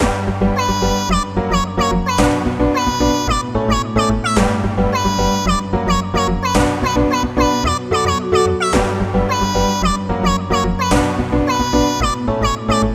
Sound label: Full Loop